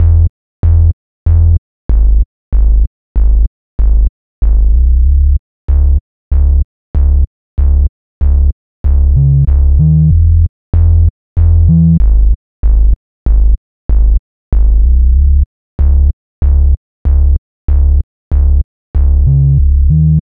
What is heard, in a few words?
Synth loop 95 G#m; Synth Bass Loops; Slate Digital BASSHOLE Sample Pack